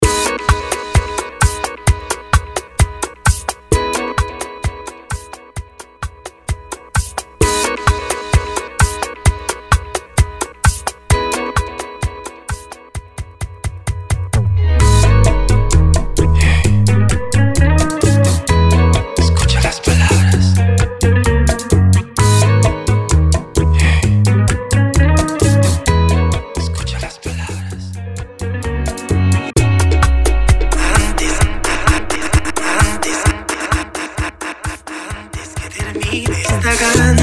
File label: Intro Outro Break 130BPM